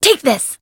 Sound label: Voice attack; rosetta